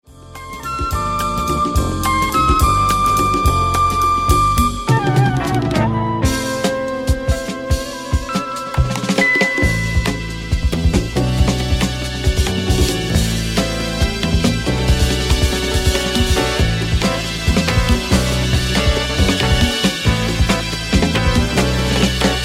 Storm Flute

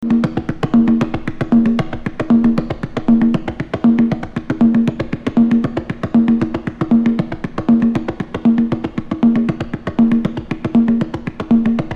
Rites Percussion

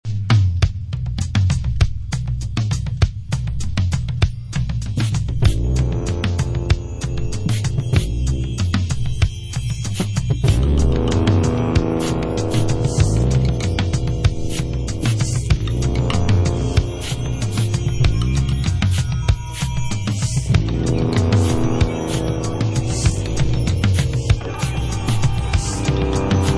jungle beat

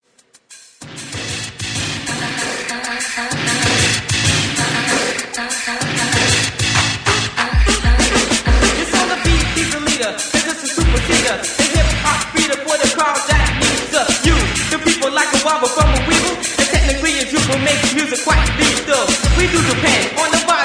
hardcore hip hop

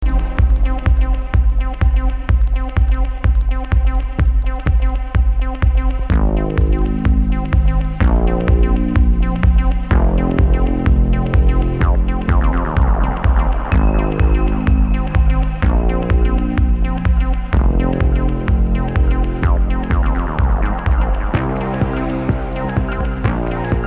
Flute Dreams

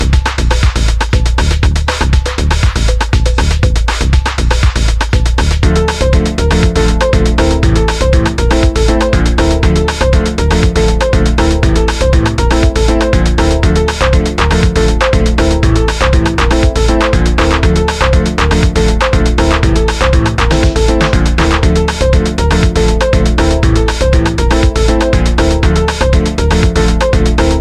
Piano Jam

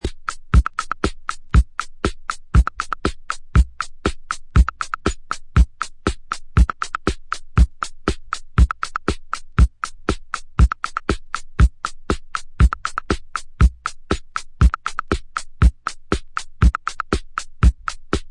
drums

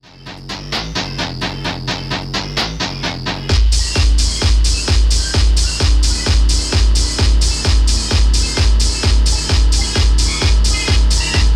Fuzz Remix (130 BPM)

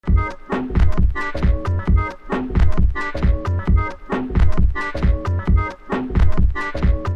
loop a locked groove

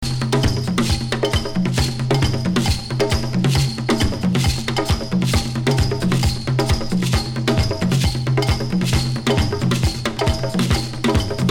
Alligator Dance Drums

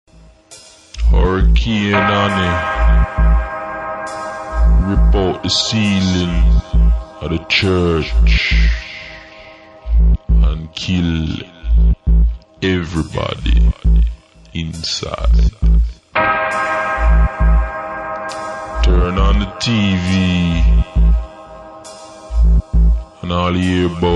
Sine; Hyperdub

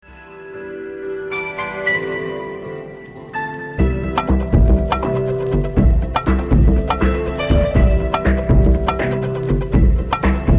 fantastic Piano

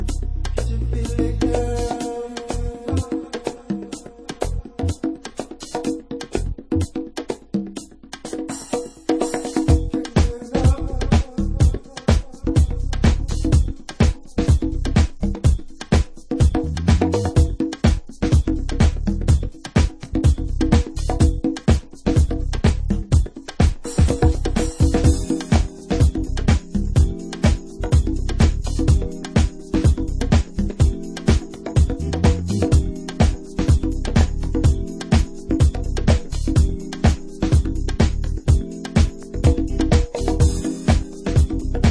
Instrumental

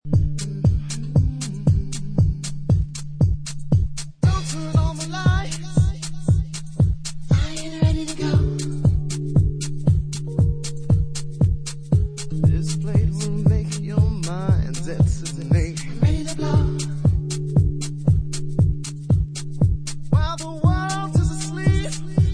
sax

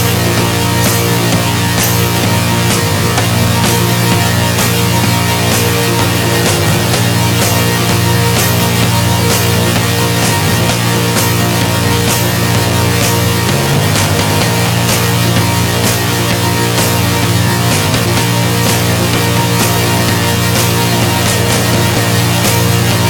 Organs and Drums

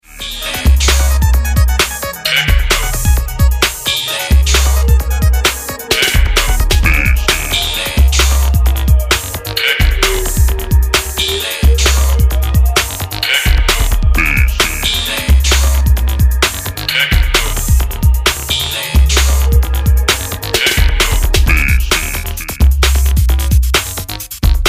Electro Techno

